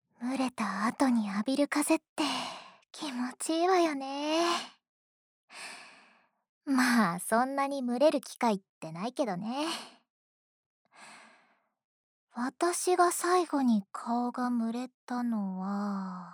耳吹き, 右耳, クレッシェンド

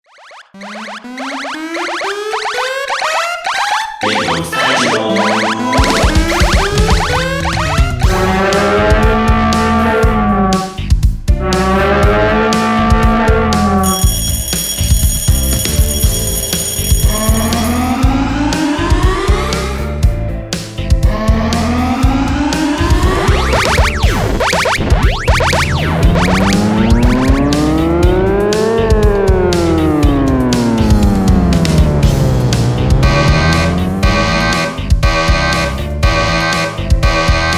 警報アラームサンプル; ASMR; 効果音; ぴぐみょん効果音素材集; ぴぐみょんスタジオ

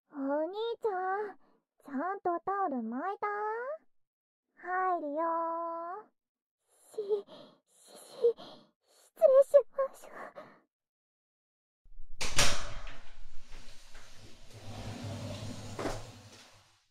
お風呂でシャンプー対決; 1番勝負

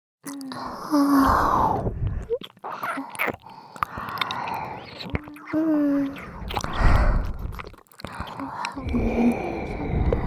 ラブラブキス&右耳舐め, ASMR

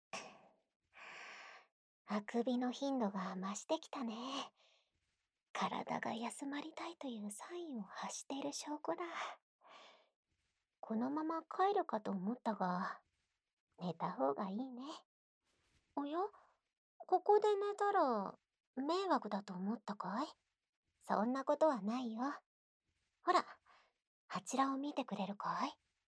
子守唄×お休みトントン; 快眠

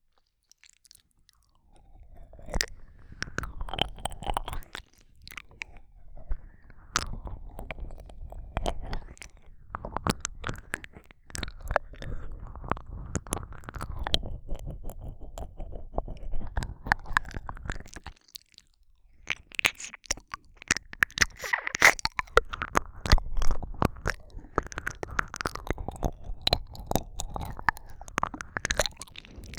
ドキドキするいろんな耳舐め, ASMR

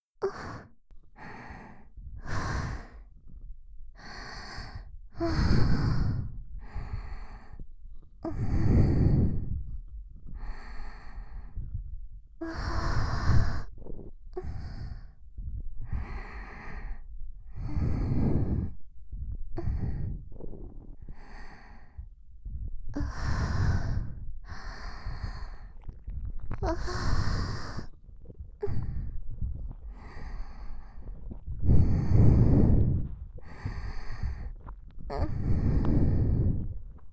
ねっとり触手&吐息+片耳だけループ

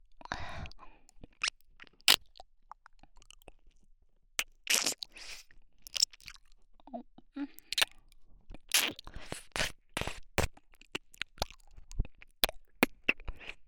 耳舐め＋オナニー部分のみ(コメント読み上げなし)

